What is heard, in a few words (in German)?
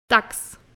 Dachs, wildtierkamera; kinder